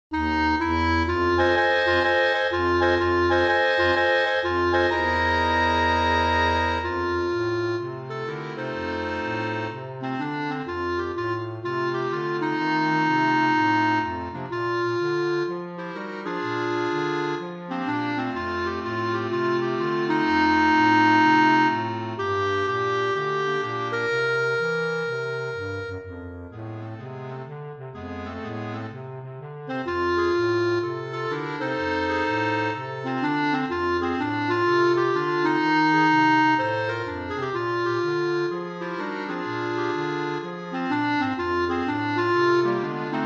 clarinettes

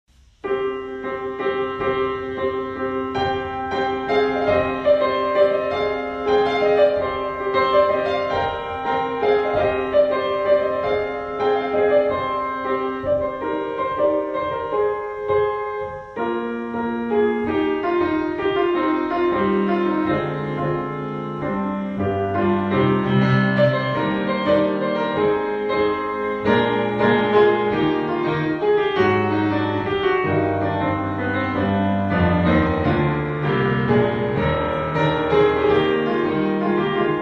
piano Kalamatianos; Le petit festival du piano

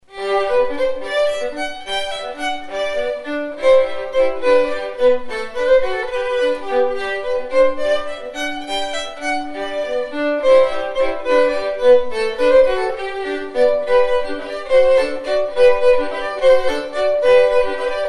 Valse du vertoudjit

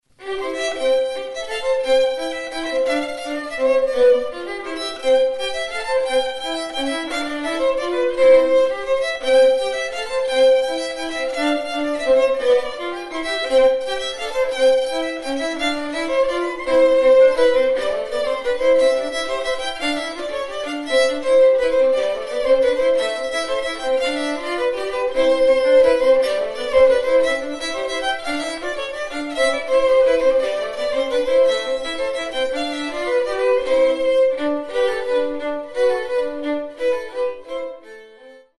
La mazurka des familles

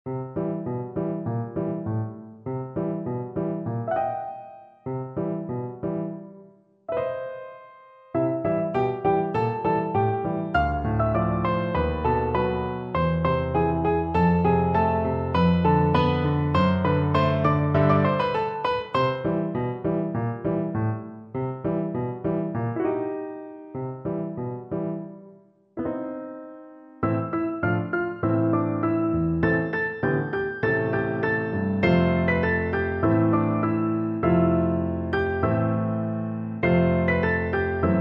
Merci sapins pour piano 4 mains

